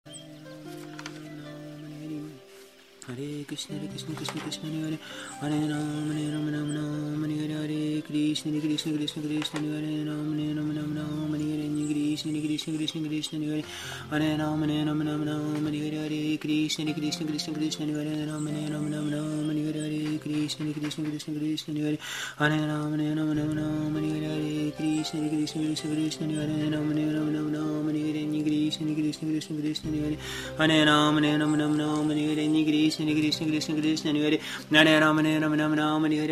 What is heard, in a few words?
Japa with birds singing and music; ISKCON Swamis; His Holiness Bhakti Vijnana Goswami